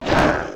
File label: Damage